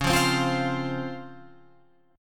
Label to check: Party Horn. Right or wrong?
Wrong